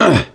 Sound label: hurt minor; survivor; gambler